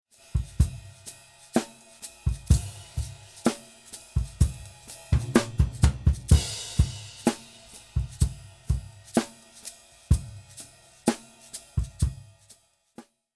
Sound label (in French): batterie avec midside